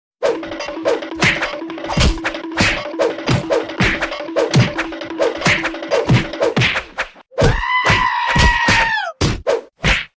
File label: beaten up